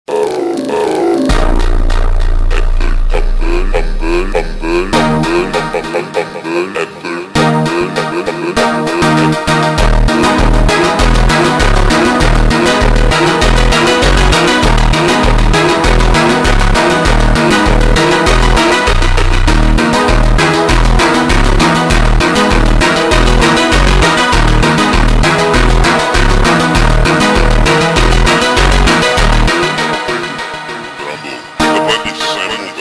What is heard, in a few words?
Funk super Slowed